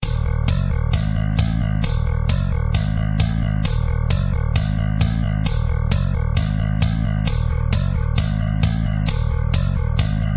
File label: instrumental